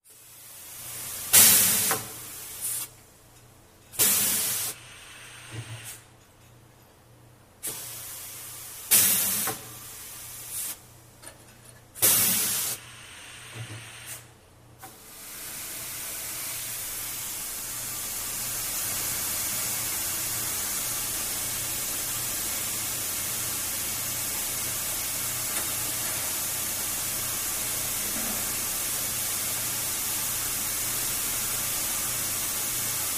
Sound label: Cleaners Steam Press, air